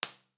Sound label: rir; beschallungstechnik; rew